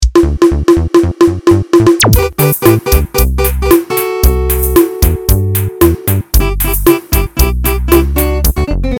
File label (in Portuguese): solo batera